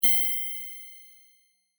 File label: ding